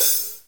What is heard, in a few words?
Hat Open; osu